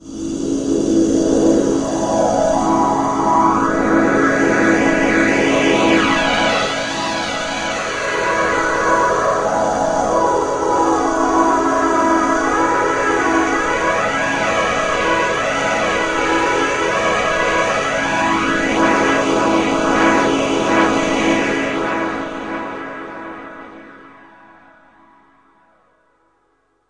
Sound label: super breathy pad; single samples